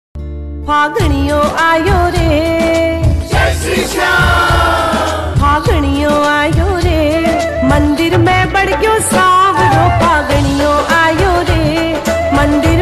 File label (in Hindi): khatu Shyam ringtone bhakti ringtone